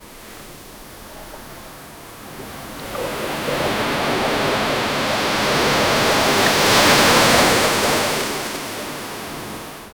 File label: lapping